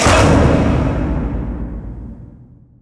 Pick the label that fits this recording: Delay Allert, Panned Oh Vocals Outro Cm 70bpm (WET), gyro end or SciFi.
gyro end